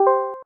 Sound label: notification